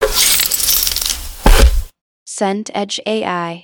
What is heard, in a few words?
shovel soil